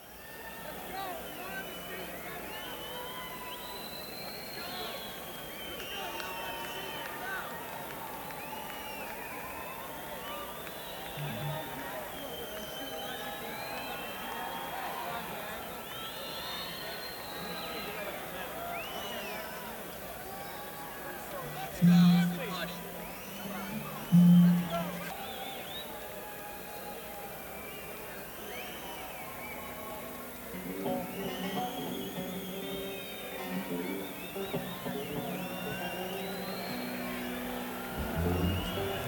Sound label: Crowd Tuning; neumann u87